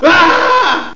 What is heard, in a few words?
SCARED; carmdemo